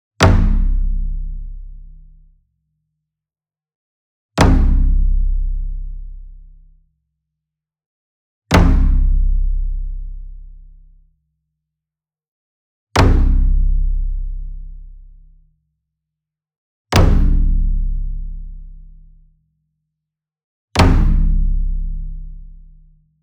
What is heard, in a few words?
SINGLE DRUM Taiko 28 Inch Flam Hard Beater Near; boom library; Cinematic Strikes Construction Kit